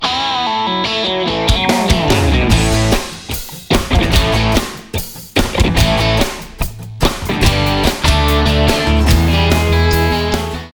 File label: Country